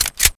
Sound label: railgun chamber